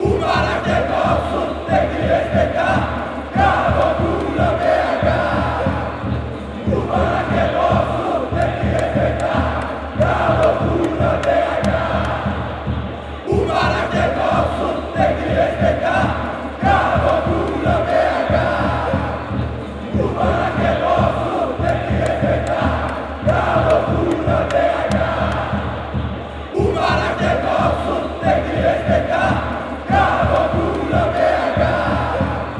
o maraca e nosso, chants

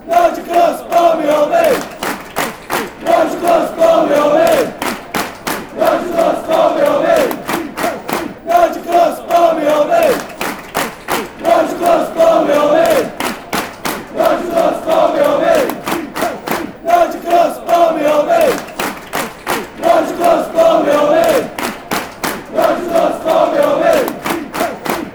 fan chants; nigel cloughs

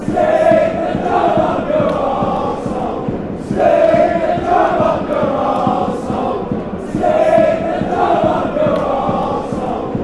stick drum, chants

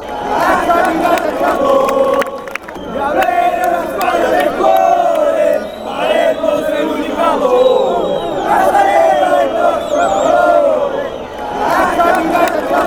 heart fanchants

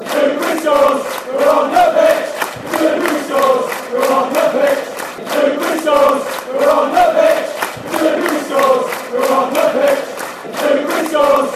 if scores fan chants; duberry

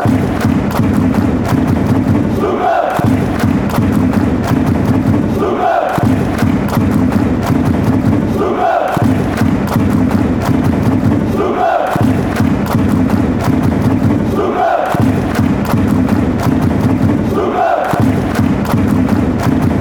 drums, chants; sturm graz

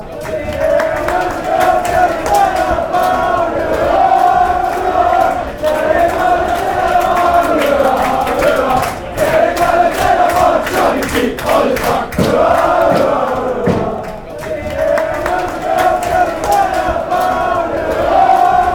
sheep, chants; inverness

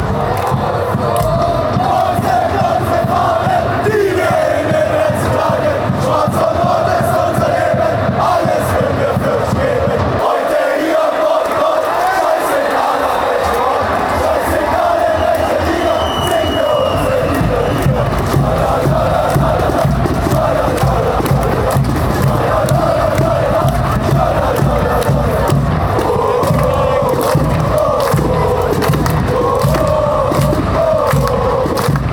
woah tralala, fan chants

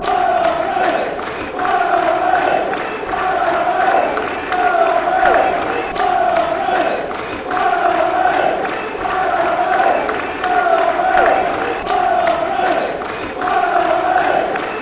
barmy army

